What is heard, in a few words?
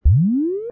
Single Sweep